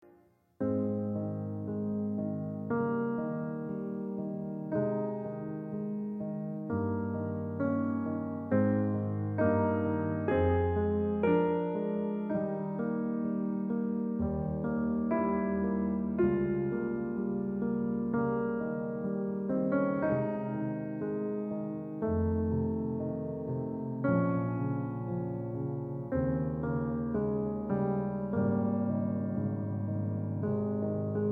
Piano Sonata No. 8 in C Minor Op. 13 Pathetique II; Adagio